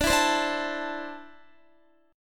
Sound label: D 7th Suspended 2nd down Guitar; D7sus2; strummed